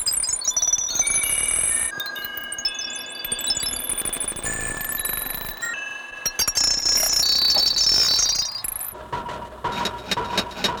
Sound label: tinkles n hammer